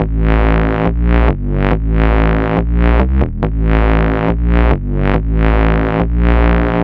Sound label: 140 Am, Melodic Loops; M8; Electronisounds